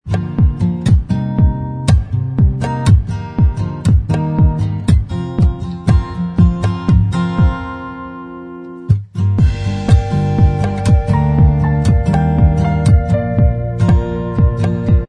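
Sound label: instrumental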